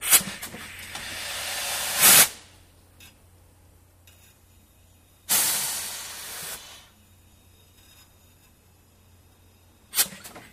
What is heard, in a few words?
Cleaners Steam Press, air